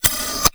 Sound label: CYBER DOOR, CYBER TOYS; Spectrasonic Distorted Reality 1